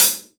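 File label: ambient hi-hat closed, Acoustic kit; Akai MPC2500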